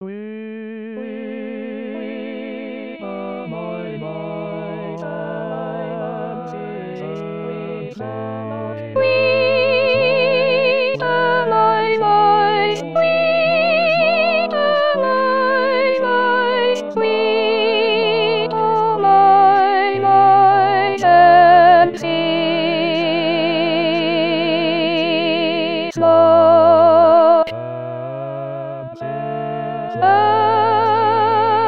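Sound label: Weep O mine eyes Soprano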